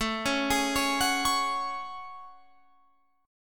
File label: A Major 13th, down Guitar Open F; AM13 arpeggiated